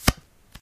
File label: card Slide